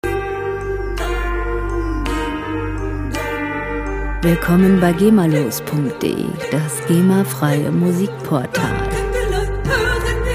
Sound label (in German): lied der glocken